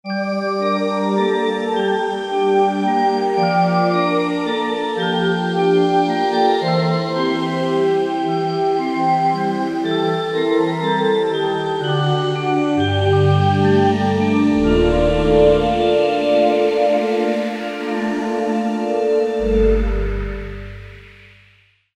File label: Bell Vox, synth